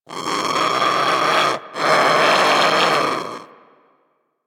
Sea Bird Vocal Snore; Warframe; Hunting Critters; Ostron Sea Bird

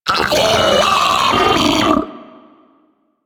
Arachnoid Vocal Player Spotted

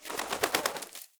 wetland forest bird scatter; Ambience; Day

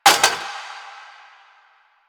Cafe Chair Short; Warframe; Ambience; Nineteen Ninety Nine

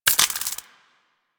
Modular Clip Large Mech, Weapons; Warframe; SU Modular Guns

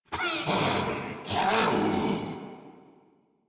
Laugh Ghoul Sarge, Barks Battle

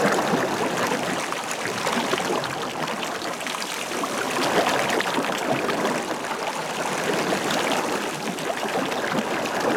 Coolant Stream Loop; Warframe; Venus Open World; Venus Solaris United

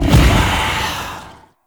Wraith Leap Jump; Enemies; Warframe; Zariman

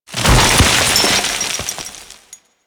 Moon Bolder Crack; Ambience; Warframe; Orokin Moon

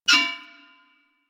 Melee Impact Metal; Warframe; Weapons

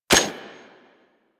Fire Mech; Lato Vandal Redux

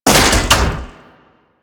Weak Spot Vent Close; Warframe; Sargas Ruk

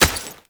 Step Dirt, Footsteps

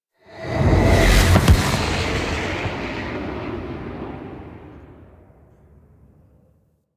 Fly By Slow, Vehicles; Zariman Liset; Flight

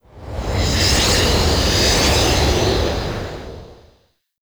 Grapple Flight Whoosh; Enemies; Weapons; DE; Warframe; Teshin

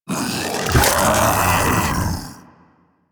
Splitter Join; Warframe; Infested; Grey Strain

